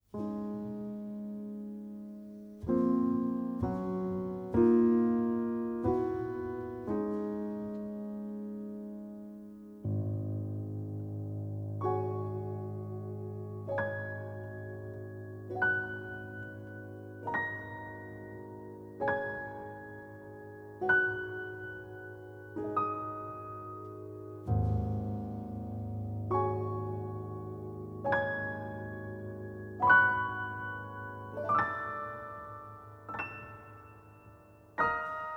piano, lonely version; Neon Genesis Evangelion; Evangelion S2 Works

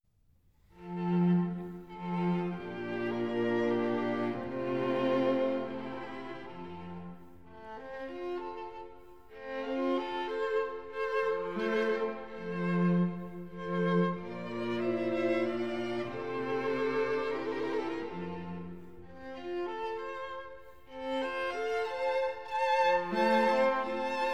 String Quartet No. 15 D minor second movement; Mozart; Legend of Galactic Heroes; Galactic Empire SIDE

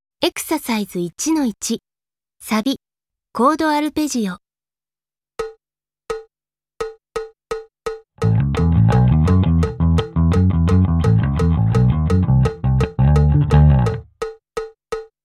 Arpeggio

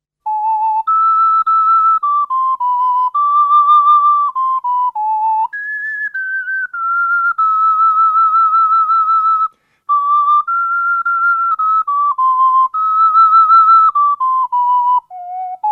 Ocarina; Shoukoujo Sara; Sekai Meisaku Gekijo Memorial Ongakukan

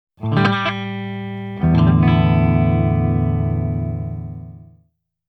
Back Guitar; K-ON

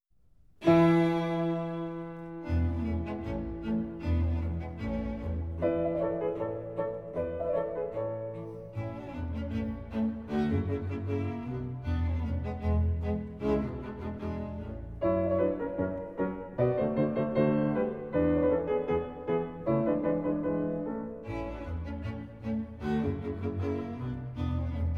Piano Quintet; Trout fifth movement (Schubert); Legend of Galactic Heroes; Free Planets Alliance SIDE

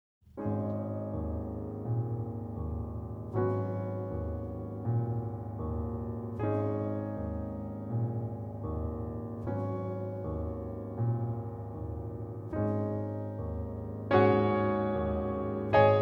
piano, normal version; Neon Genesis Evangelion; Evangelion S2 Works

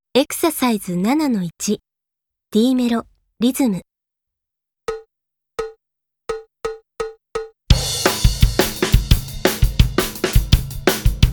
Mellow Rhythm; K-ON

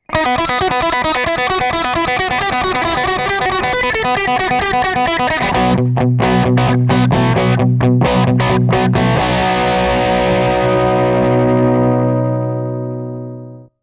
Guitar Image; K-ON

